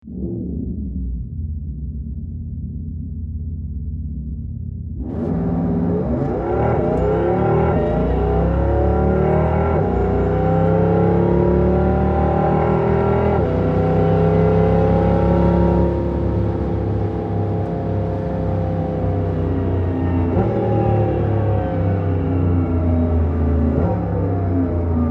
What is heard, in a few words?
taycan e shift sound interior sportplus; Porsche; fake gearshifts